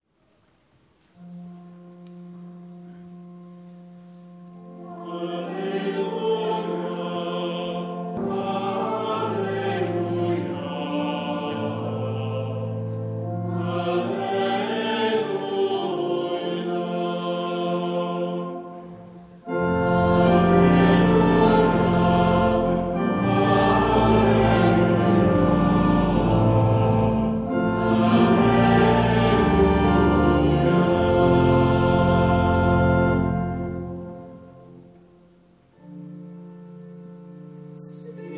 alleluia sung by the priory singers with congregation at truro cathedral